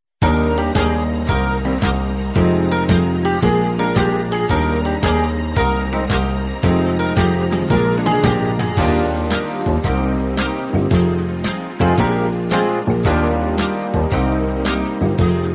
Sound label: brass minus